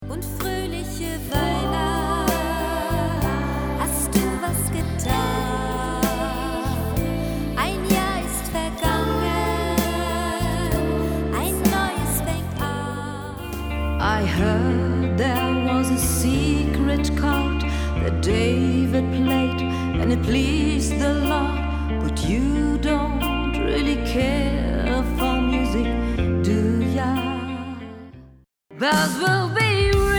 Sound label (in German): Christmas Popsongs PLAYBACKS